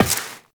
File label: Step Glass, Footsteps, Walk; Warframe; Tenno